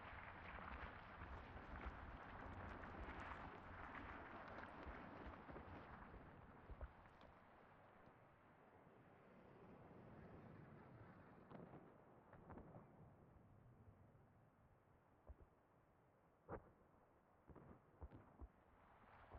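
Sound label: interior closed room; Titanfall 2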